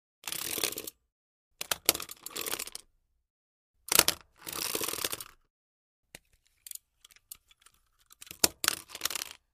22 Ruger Revolver, foley gun movement

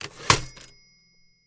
Typewriter Carriag; typewriters

